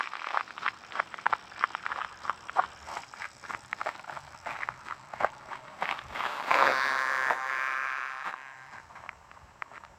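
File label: bicycle going down a gravel; bike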